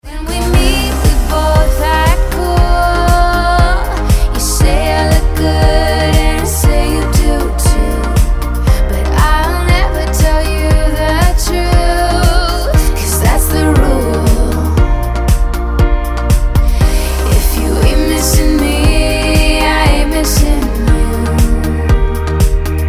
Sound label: Hunger EDM